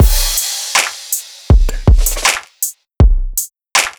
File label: Drums unprocessed